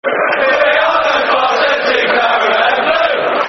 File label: Football Chant; West Ham WHFC